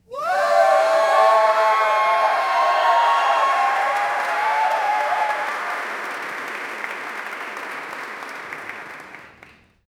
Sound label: applause